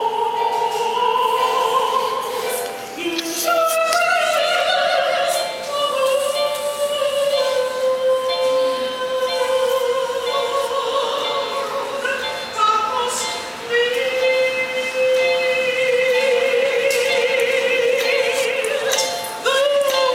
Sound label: subway singer